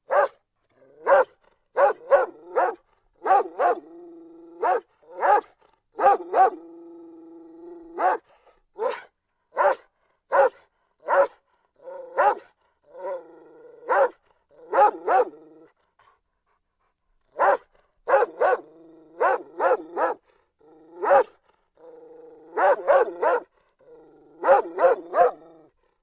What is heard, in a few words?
one dog barking; audacity